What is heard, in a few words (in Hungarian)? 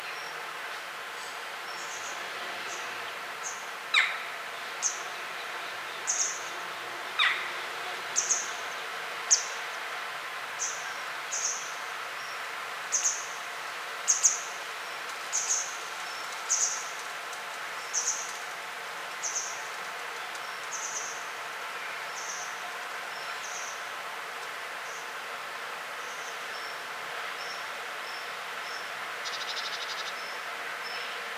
hegyi billegeto hangja